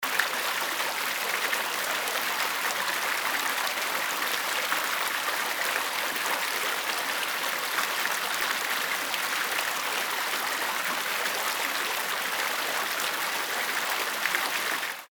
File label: water flowing